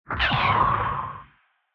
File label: Retro Anime Robot Short